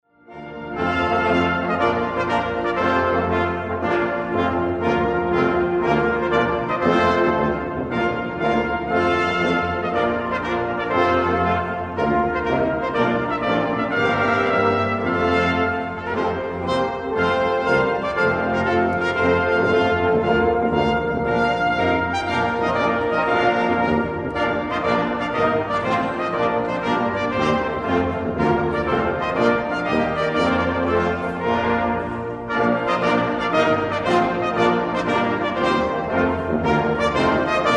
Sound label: trumpet tune and air